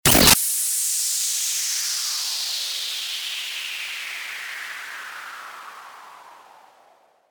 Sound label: STARTER WHOOSH